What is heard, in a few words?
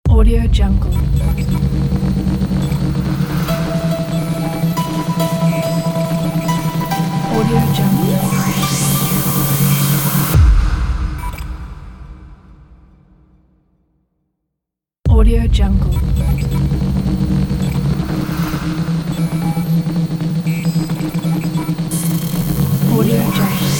hi tech logo